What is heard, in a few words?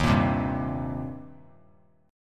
D sharp 6th; strummed; 15,17,15,17; JGuitar